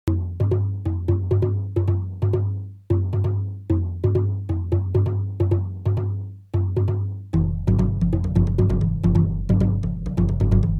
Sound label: Beachside Processed; Proteus 1